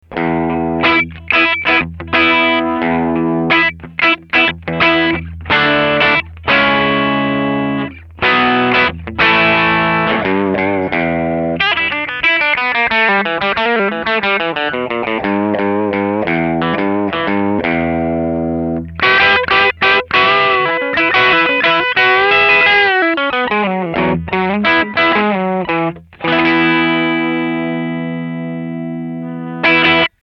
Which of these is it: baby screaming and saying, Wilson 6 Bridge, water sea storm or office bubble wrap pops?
Wilson 6 Bridge